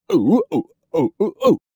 Man Dance Happy; Zomboid; Lifestyle

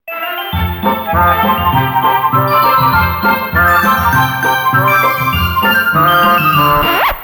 dj booth fail; Zomboid; Lifestyle

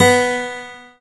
Guitar Acoustic Failstate; Instruments; Zomboid; Lifestyle

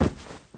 Body Falling; Zomboid; Lifestyle

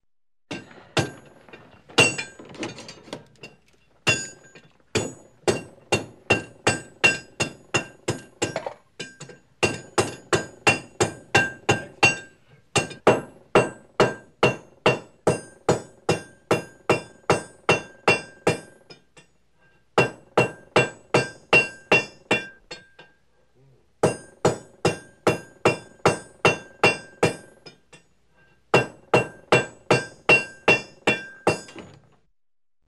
hammer build; Zomboid; tsarslib

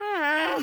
Woman Frustrated; Zomboid; Lifestyle

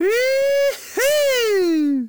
Man Woohoo; Zomboid; Lifestyle

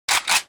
AK47 rack; Zomboid; VFE

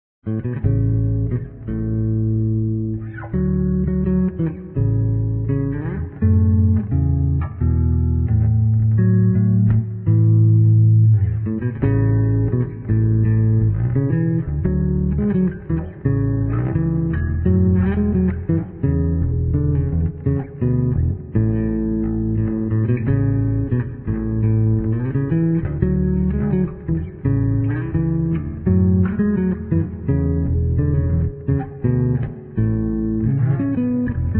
Guitar Electric Bass; Zomboid; Lifestyle

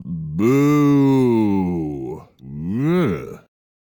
Man Booing; Zomboid; Lifestyle

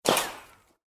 heli fire impact; Helicopter

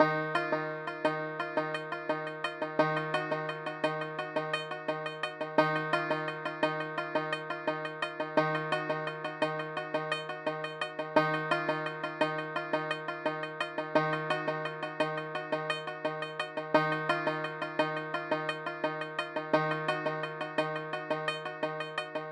banjo; Zomboid; EntertainYourself

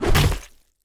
2x4 Hit Gore; Scrap Weapons; Zomboid

